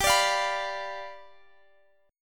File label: G 7th G down Guitar Standard; strummed; capo=3